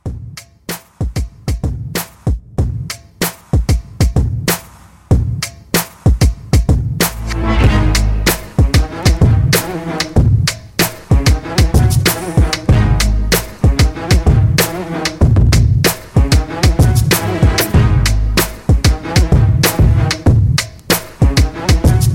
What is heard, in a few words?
Throwback Re Drum 95